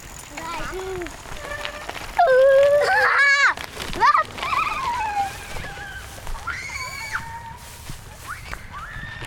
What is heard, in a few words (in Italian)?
in discesa con urla bambini; Ho urlato perché avevo paura di cadere.